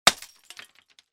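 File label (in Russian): разбили керамическую или фарфоровую фигурку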